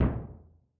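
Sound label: Gran Cassa, Kick, Yamaha DTXpress-II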